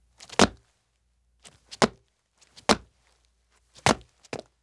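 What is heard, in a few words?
Footsteps Jumps Lands Hard Steps; Fairlight Sound Lib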